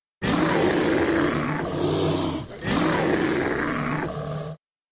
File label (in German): eulenbar schrei